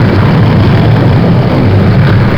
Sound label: roll wood plywood, terrains; Tony Hawk's Project 8; XBOX